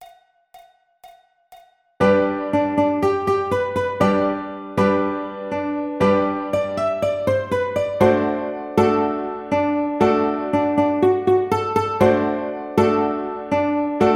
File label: Ukulele; La Cucaracha; Jolly Roger Ukulele Book 1